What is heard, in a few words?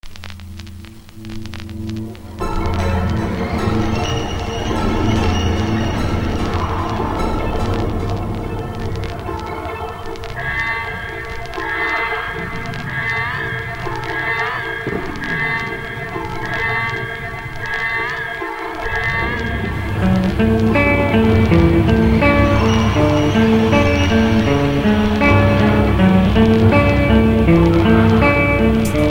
Horror Mix